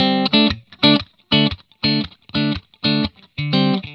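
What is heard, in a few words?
120 D, Vintage Blues Guitar rhythm; USB Soundscan vol.22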